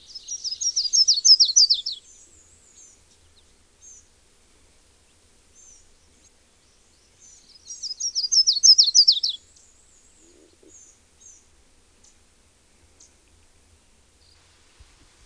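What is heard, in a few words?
Yellow Warbler, Birds; Coastal Louisiana; Explore Coastal Louisiana with Boudreaux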